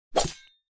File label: sword swing